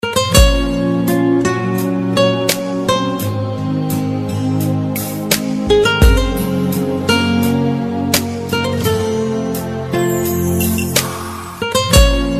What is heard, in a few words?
life rhythm